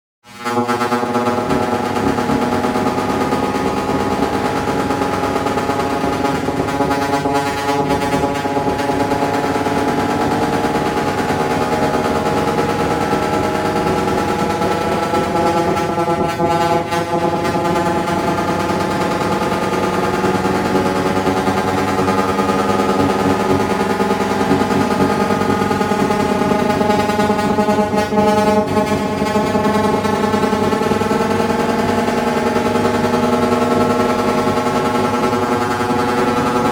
buildup

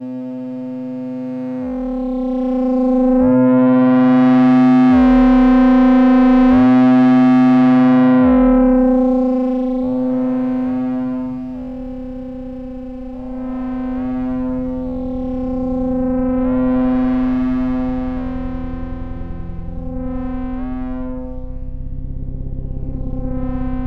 reverb

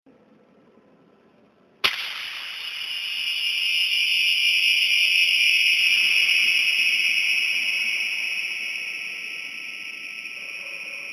Clapping

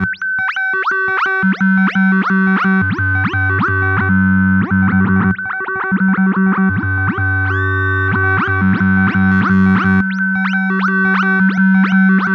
bubbles

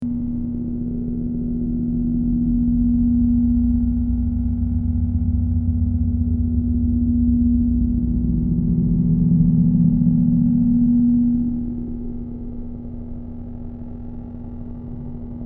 december noise

